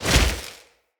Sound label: Casting Device Cast Right